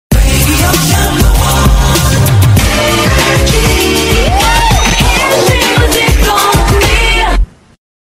jingle; nrj 2001

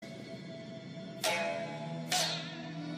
biwa; Nakimes biwa

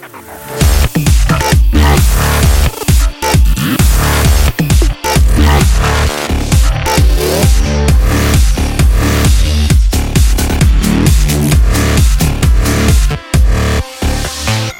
Thumpa Thump

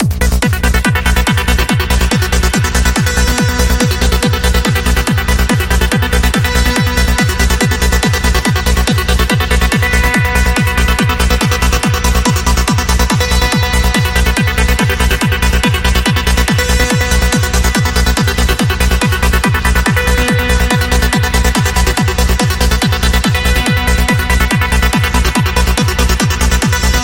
Psy Trance

